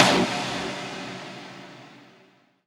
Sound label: SD HOU, Dance Mega Drums; Best Service